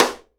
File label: SIDE STICK, Live Snares